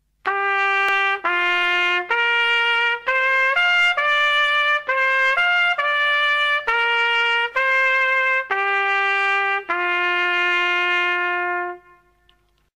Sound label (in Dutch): De trompet met of zonder demper zonder